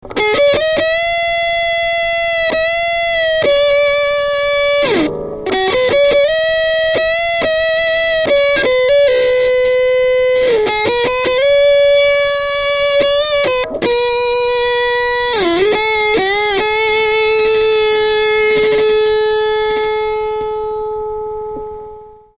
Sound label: blues